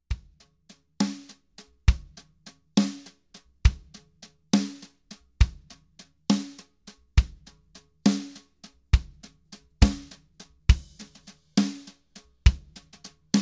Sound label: Brush example